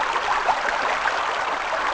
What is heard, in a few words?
ambient stream; MX vs ATV Unleashed